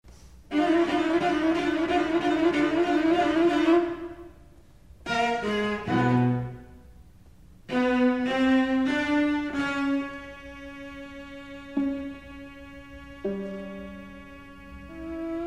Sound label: Seven Etudes, string chamber; a due 1973